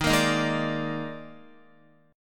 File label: Eb Major 7th Suspended 4th, Guitar; strummed; EbM7sus4